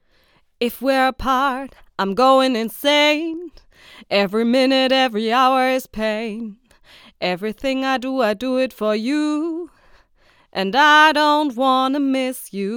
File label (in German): gesang f; akg c414 xls